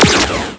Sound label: laser